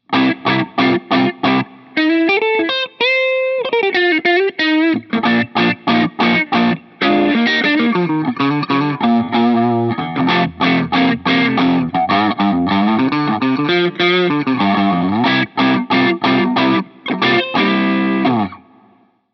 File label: semi, guitars